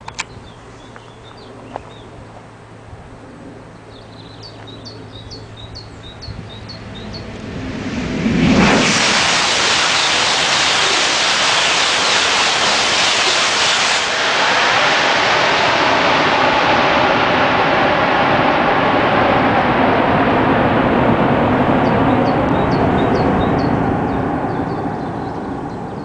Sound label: ice3; schllenberg